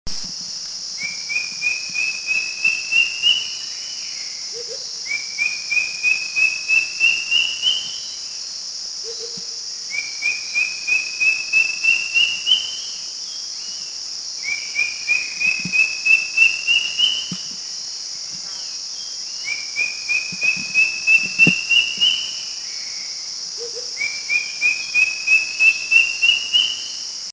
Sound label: Philippine Drongo Cuckoo; Philippines